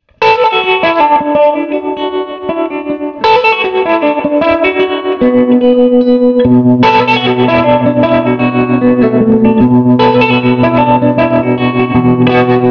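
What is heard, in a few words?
SG tremolo dirt clean, Gibson Historic SG; SG Tremolo Dirty Clean v1; Swart AST Pro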